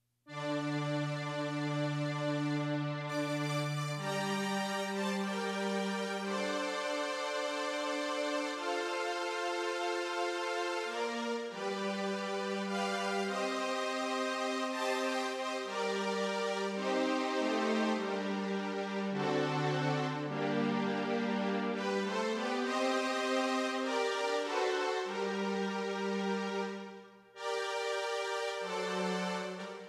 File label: synth